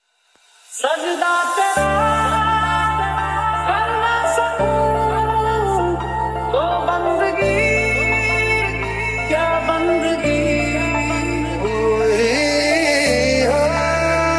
Hindi dj remix hindi ringtone

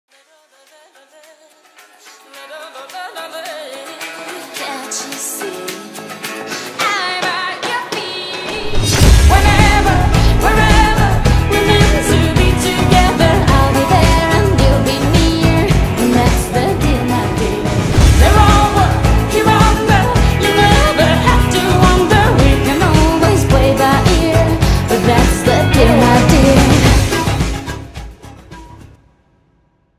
english ringtone

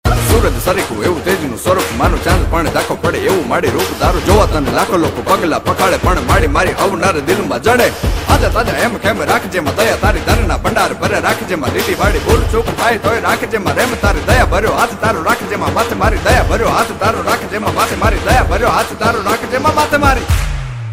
gujarati ringtone

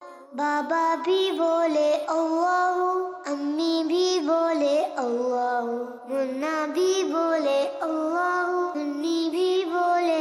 islamic kids poem ringtone

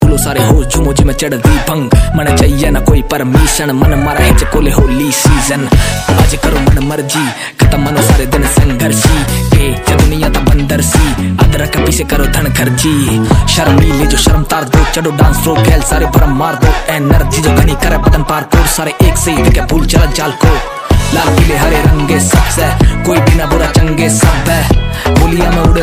Rap hindi ringtone

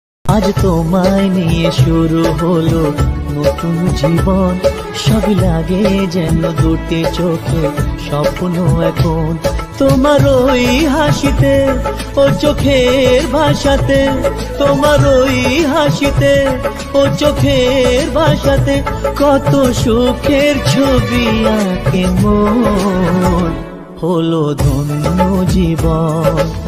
bengali ringtone

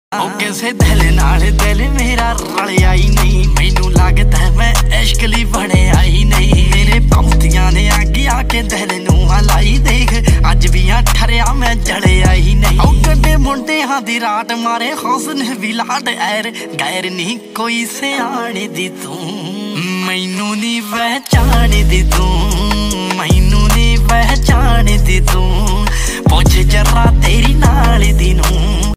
Sad Punjabi Ringtone

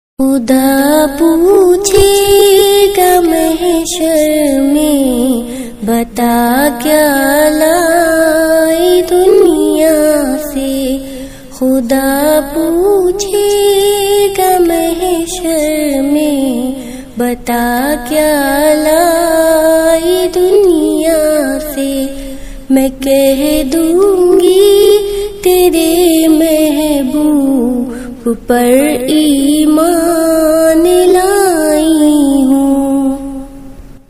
islamic ringtones

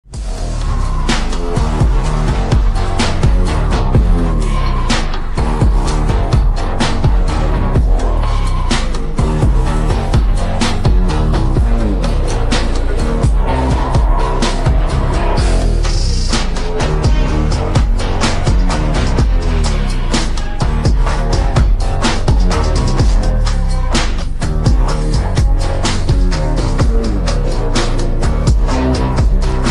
BGM ringtone

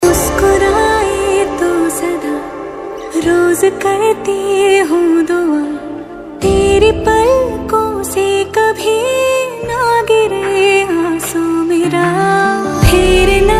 female, hindi ringtone

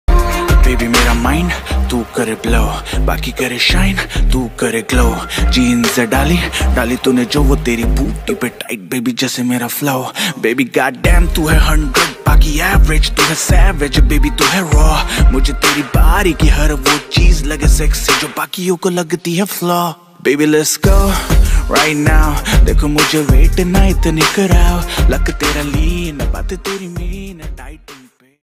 rap ringtone

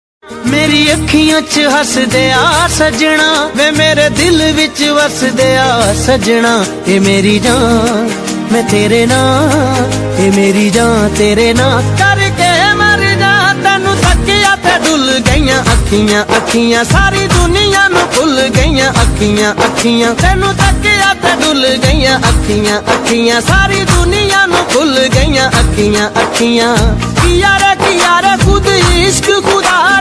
panjabi ringtone

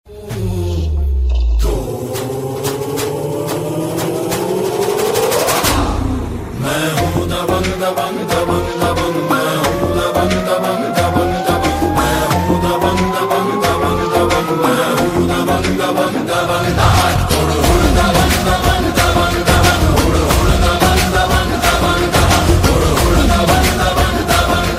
BGM ringtone

